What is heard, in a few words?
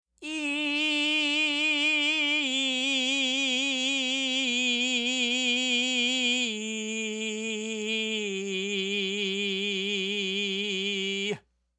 Overly Bright i